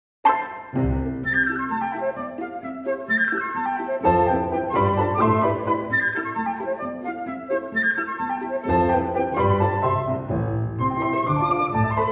Suite Comique For Flute, Piano and Strings, Allegro